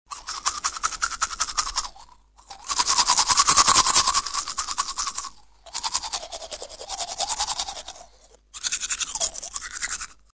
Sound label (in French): Brossage de dents